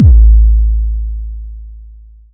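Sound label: 909 BD long, kick drum; Goldbaby Tape808 & Tape909; The Tape 909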